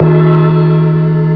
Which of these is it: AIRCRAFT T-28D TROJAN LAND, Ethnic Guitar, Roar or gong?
gong